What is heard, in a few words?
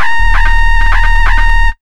FX LP, Big Beat; USB Soundscan vol.25; Big Beat Drumloops; AKAI